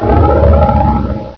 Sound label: drown; quake2; ontra